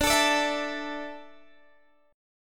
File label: D Suspended 2nd D Guitar Standard; x,17,19,19,17,17; strummed